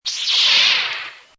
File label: lightsaber on; gmod; star wars battlefront 2 galaxy map; kpsmd